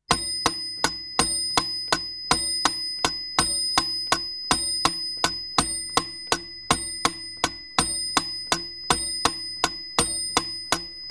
OROLOGIO A PENDOLO

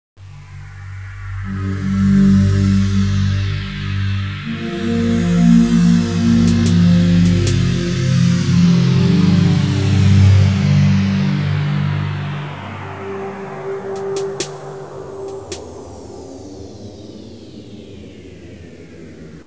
audio suonerie musica mistica

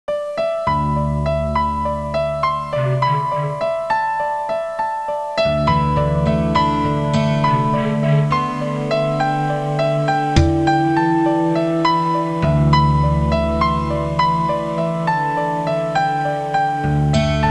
suonerie musica hawaiian

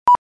suoneria beep

